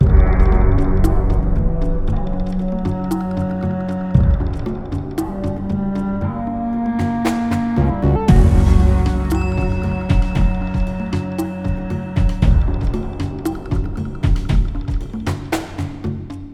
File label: Boss Tao